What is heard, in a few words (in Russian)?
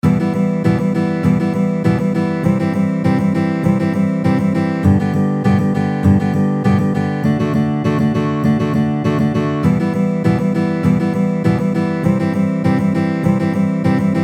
Boj Trojka na gitare